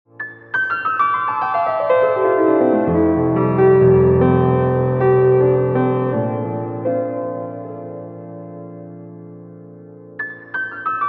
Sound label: Sweet Piano